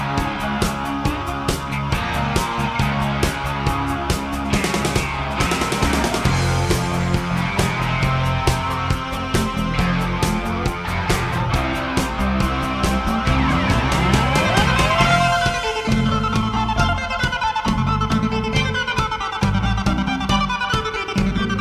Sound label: (instrumental)